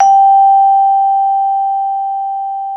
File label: VIBE G6, Jazz Combo